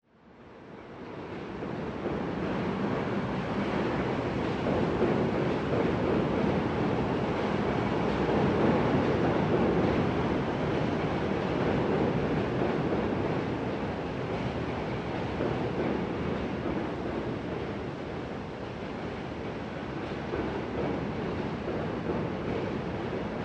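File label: City Ambience Underneath, el trains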